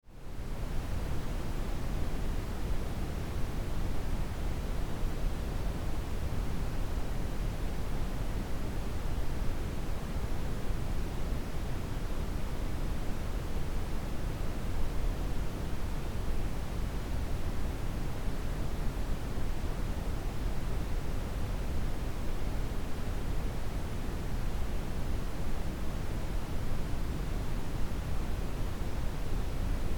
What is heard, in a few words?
brown noise